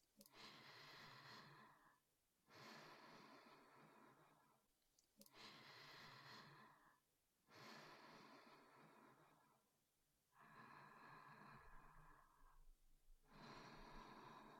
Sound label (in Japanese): 添い寝 寝息ループ（囁き重視, ASMR